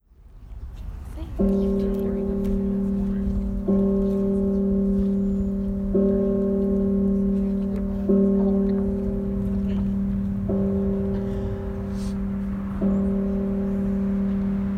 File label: Bell Toll